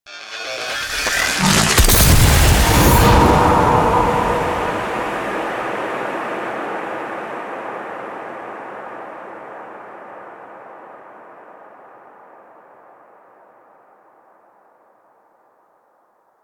End Blast; Cinematics; Shrine Intro; Sentient; Warframe; Sacrifice

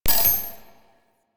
Flying Robot Beep

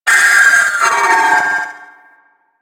Trooper Vocal Death; Warframe; Sentients

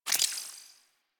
Alchemy Lab Add Mineral To Meal, UI; Warframe; Liset

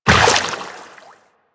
Step Water, Footsteps Walk; DE; Warframe; Tenno

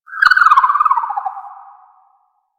Drifter Camp Valley Water Creature; Ambience; One Offs; Warframe; Quests; The New War

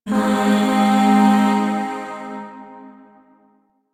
Zariman Void Shawzin Min Chord

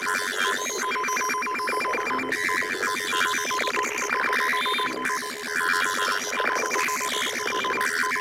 Console Loop; ambience; props; loops; Warframe; Venus Open World; Venus Solaris United

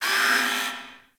Gas Robot Repair Arm; Warframe; Corpus Gas City

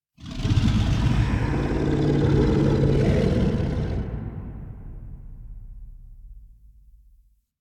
Idle Agile Unarmed; Warframe; Voruna; Idles Agile

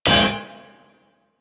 Melee Hammer Impact Generic; Warframe; Weapons; Hammers

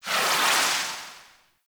Alchemist Distill Ability Loop End; Warframe; DE; Warframes

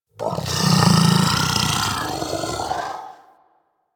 Vocal Snore; Warframe; Hunting Critters; Orokin Kubrow

